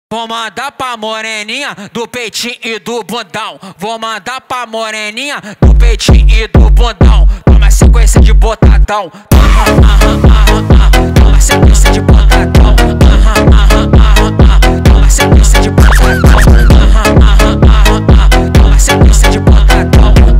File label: brazilian funk